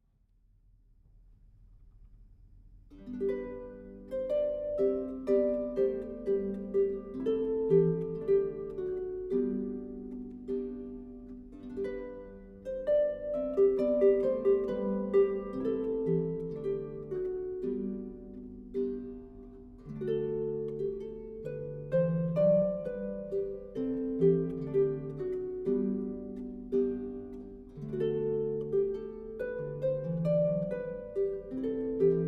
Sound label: Harpist